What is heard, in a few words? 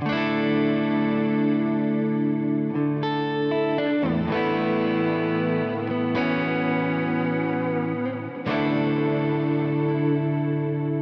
Reverb Cloud